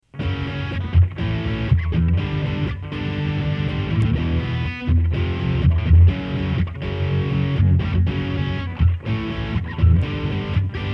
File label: sidekick drive; stomp